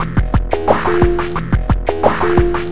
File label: DARK MATTER PULSE GROOVE, ARPEGGIATOR DRUM MACHINE; Sonic Foundry (Sony Creative Software); Vir Unis Infusion